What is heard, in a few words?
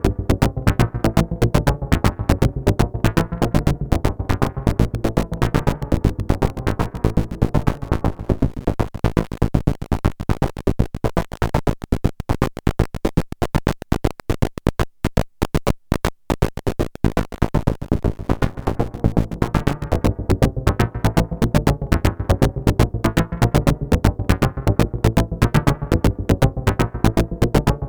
Dreadbox Chromatic Module Gate Scatter; Dystopia